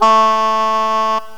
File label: Shannai; GMPlay; Ethnic